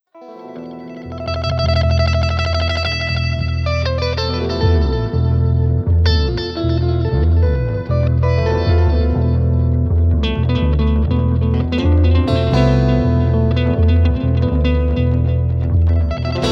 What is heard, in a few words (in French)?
Mode III Phrygien